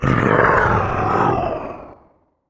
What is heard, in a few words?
ATTACK; mahlendur